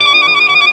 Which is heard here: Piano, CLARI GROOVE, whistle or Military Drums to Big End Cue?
CLARI GROOVE